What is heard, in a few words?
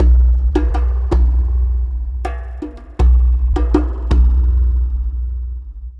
Klong Ya, Worldly Rhythms; Duality; Sonic Foundry (Sony Creative Software); Ma Ja Le Ethereal Textures